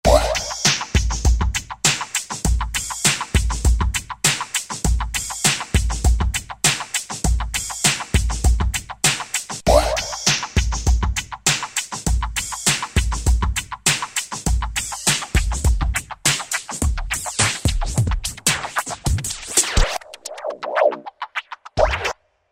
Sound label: 100 Club Mix, Hip Hop; Roland SR-JV80-12 Hip Hop Collection